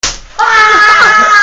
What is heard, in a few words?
aaaaa; italy